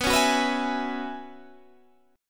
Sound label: F sharp 7th Suspended 2nd Suspended 4th, B